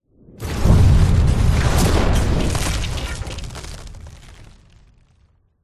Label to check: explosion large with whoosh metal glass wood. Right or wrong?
Right